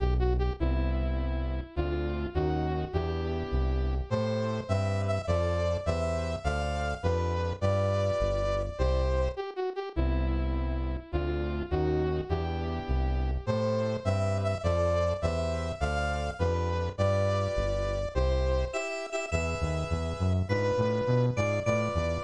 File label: Ending; SMAS; SMB